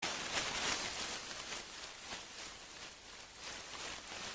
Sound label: leaves rustling